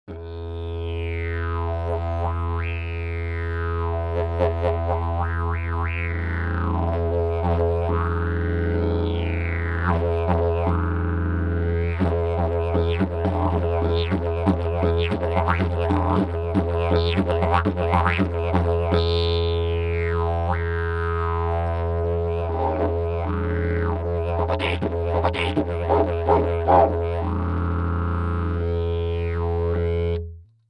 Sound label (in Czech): javor hnedy, didgeridoo